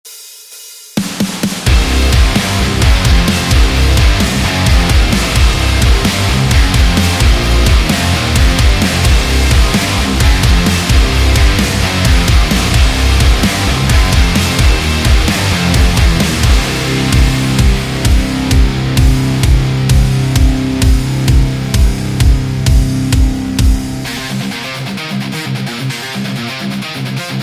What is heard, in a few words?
Metallic 90s